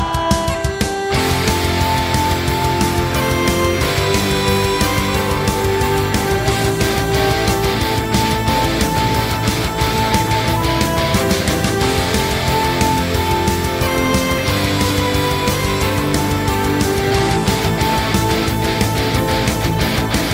Ringtone; Braveheart

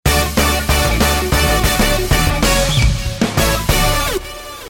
Mobile Ringtone

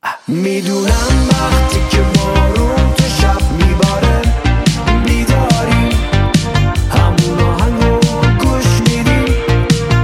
Mobile Ringtone

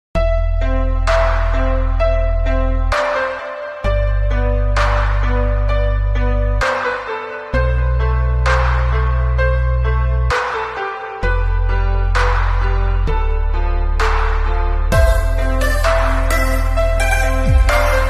Mobile Ringtone

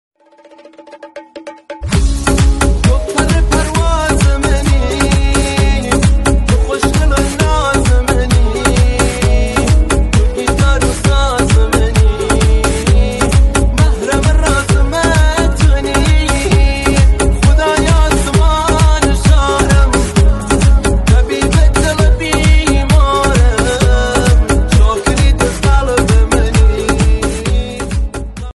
Remix Bass System

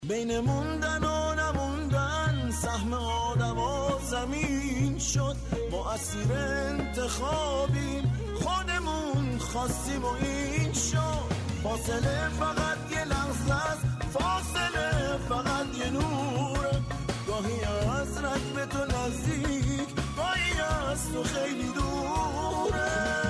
Ringtone

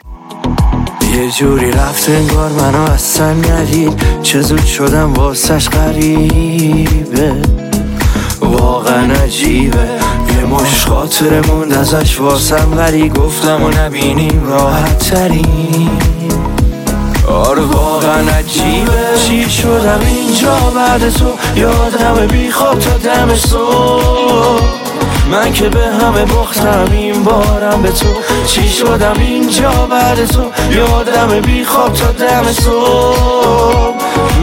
Mobile Ringtone

